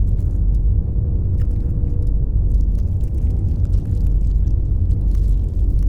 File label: pro ship colossus idle; biogenesis